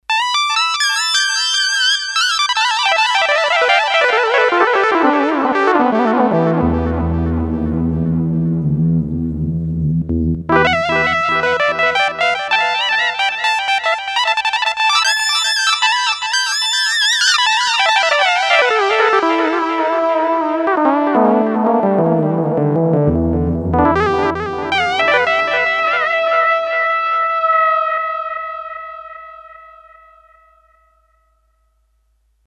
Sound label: Synth Lead, Korg DS-8; Factory Patches